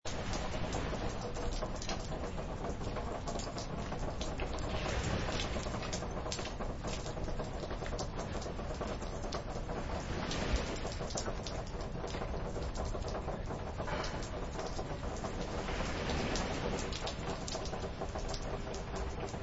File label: Rain and Beach